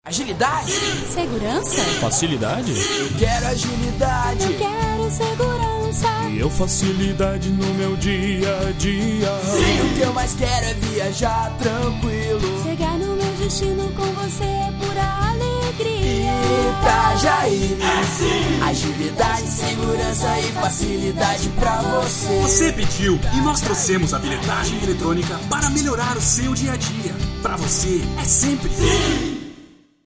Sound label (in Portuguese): jingle 30